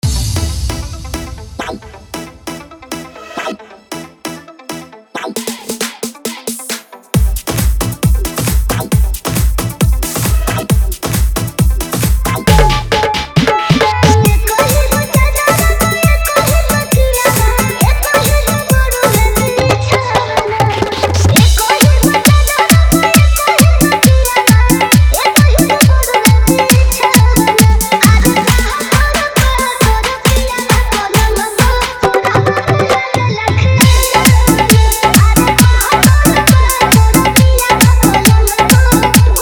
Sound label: Bhojpuri Fully Dhollki Bass Mix